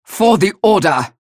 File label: match start; galena; unholy paladin; frag